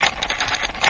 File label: PLASTIC on PLASTIC 8Bit; abbottabad compound